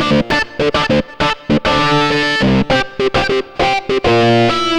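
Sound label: extra lead; comp; Sonic Foundry (Sony Creative Software); Ugly Remnants VOL-2; Leads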